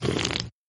slurp

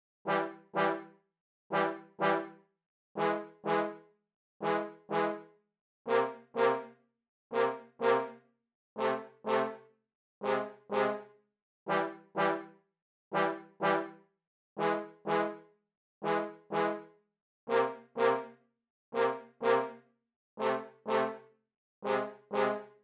trombones

